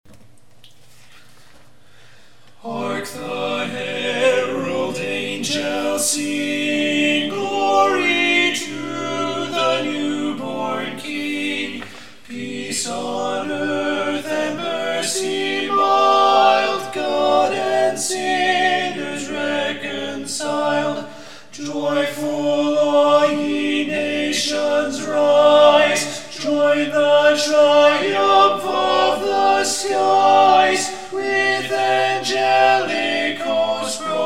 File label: hark the herald angels sing tenor